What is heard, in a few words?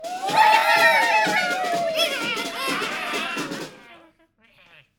Level Clear Military; Angry Birds Rio